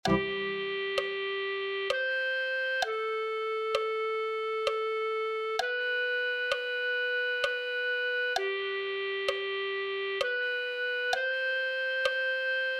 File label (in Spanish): clarinete